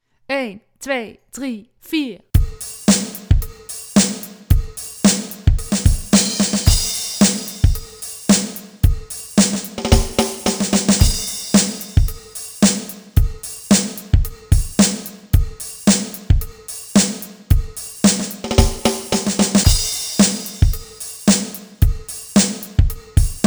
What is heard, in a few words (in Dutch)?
kokkerel lied zonder zang